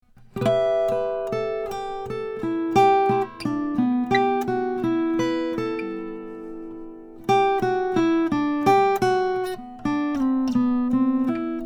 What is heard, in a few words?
fylde ariel single malt reclaimed whiskey